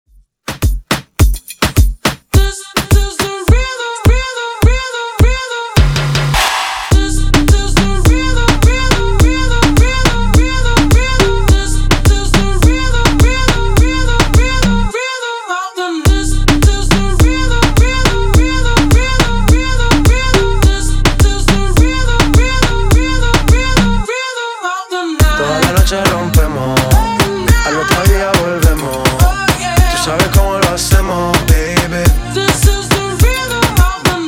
Redrum 105Bpm

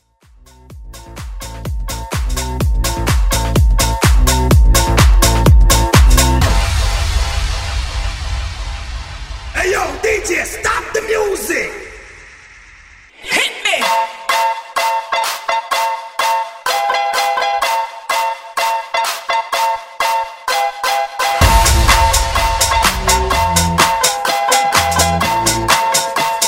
House Vocal

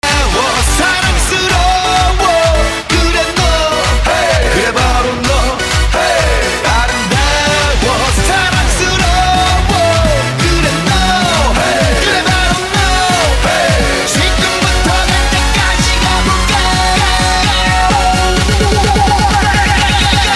Mashup Bass house

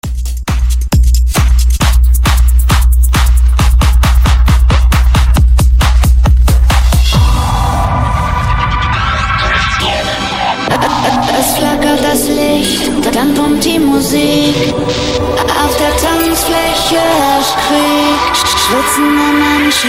Pop

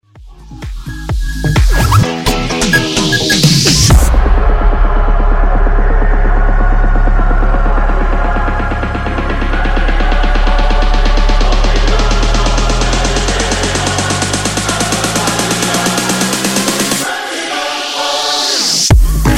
Funk Hybrid Bootleg Segue 128Bpm

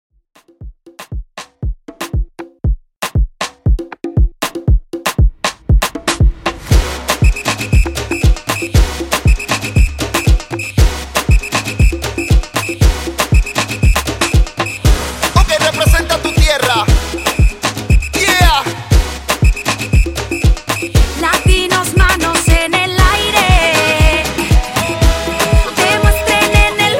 Rumba Latin Afro Reggaeton, 118 Bpm

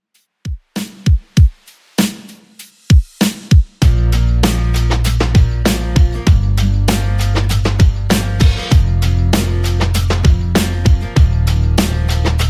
90s Alternative Rock Redrum 98bpm

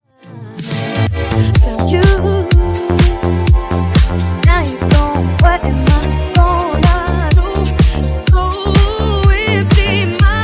Funk 125 Bpm

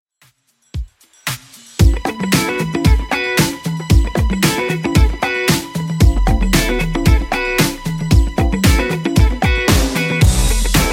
2ks DJ Friendly Redrum 114bpm

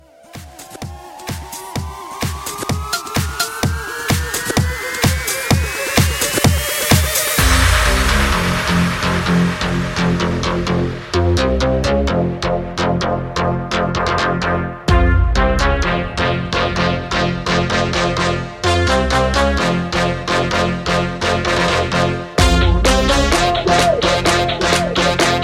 Hybrid Bootleg 128

